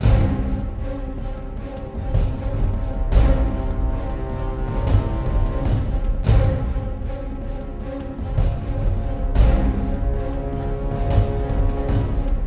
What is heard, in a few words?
Mine Battle Music